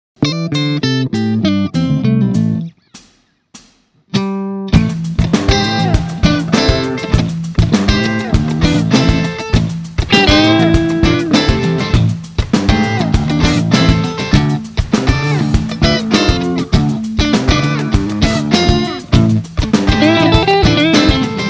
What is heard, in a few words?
Brit Wind; Hotone